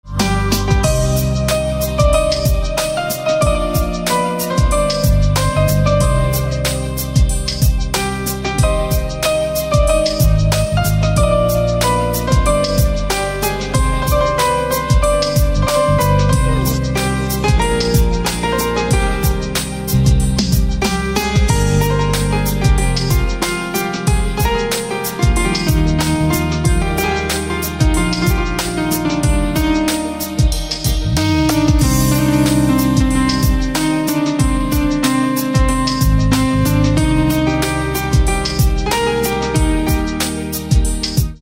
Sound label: Piano